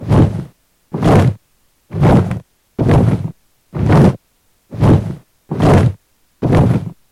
move; Skywrath Mage

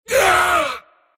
mars pain

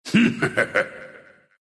laugh, ru; Invo

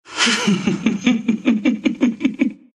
laugh, ru; dota2

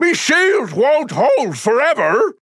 Taking Damage; Torvald; Paladins

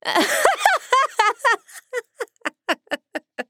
Laugh; Kinessa; Paladins

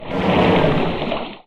blood bath; Dota 2

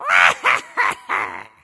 Parrot Laugh; Sobek; HighSeas; Smite

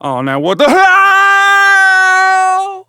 Falling; Owl

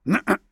Warrior Grunt Slow; Realm Royale; Hi-Tek Trooper

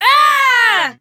Falling; paladins; Seris

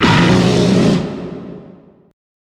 Savage Roar

